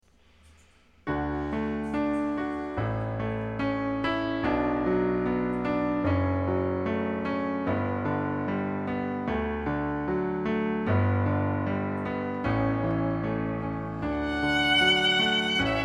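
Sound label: Canon Pachelbel Violin Piano; Acoustic Duo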